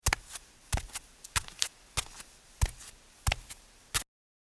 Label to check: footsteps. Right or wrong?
Right